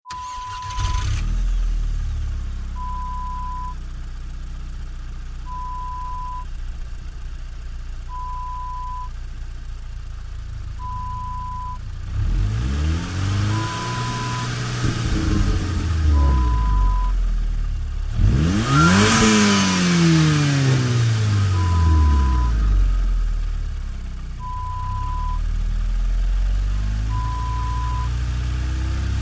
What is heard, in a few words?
Car